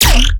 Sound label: bass hit surprise E; Virtual Riot